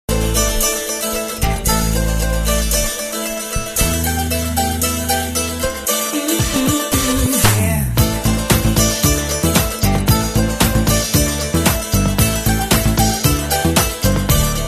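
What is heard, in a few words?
Rocking guitar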